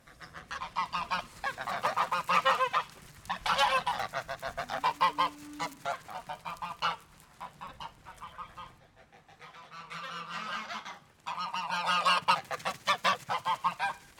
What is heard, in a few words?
Gooses honking and moving around the yard; Sound Ex Machina; Animal Farm